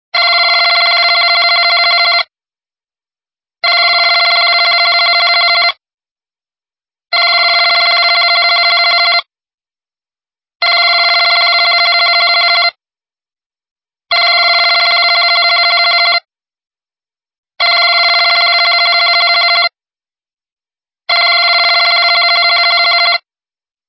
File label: different old phone